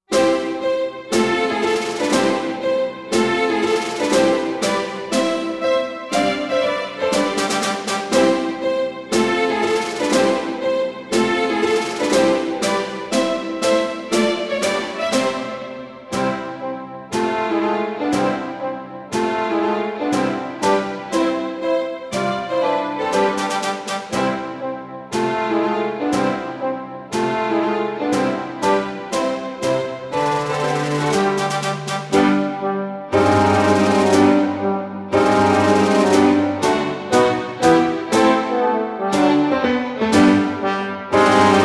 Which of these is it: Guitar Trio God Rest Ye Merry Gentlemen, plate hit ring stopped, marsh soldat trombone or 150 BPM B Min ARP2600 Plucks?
marsh soldat trombone